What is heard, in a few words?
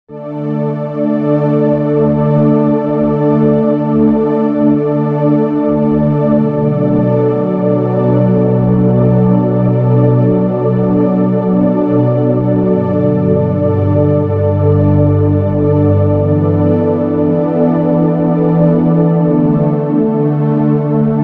Underscore PAD; Worship Flow